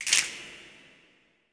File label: snap afro, afro snaps, hands; Roland LCDP14 Africa VOL-2